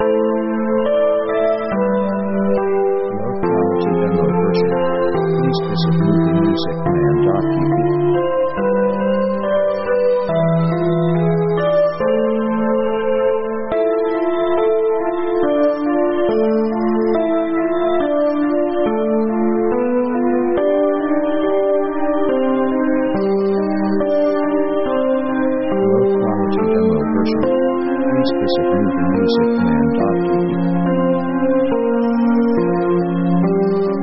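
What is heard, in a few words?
synth orchestra french melodramatic; synth themes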